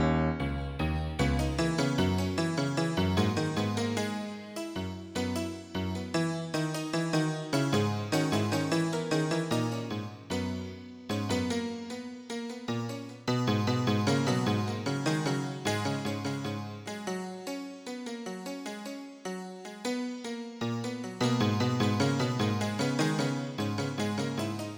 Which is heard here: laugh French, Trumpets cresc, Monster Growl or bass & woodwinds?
bass & woodwinds